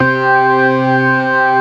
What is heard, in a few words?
STOPS, ORGAN; Keith Emerson Signature Series